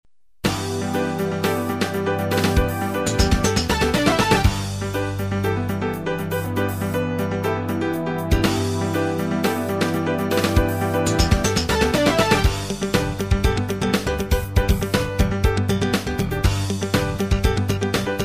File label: Funk